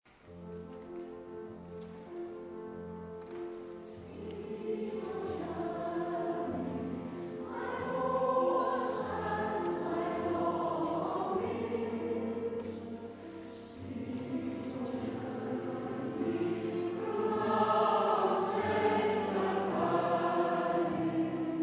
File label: Chorus; Hebrew Slaves